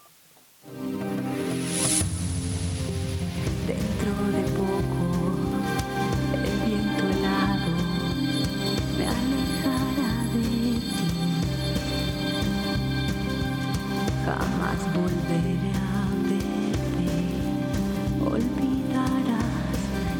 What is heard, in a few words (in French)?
Musique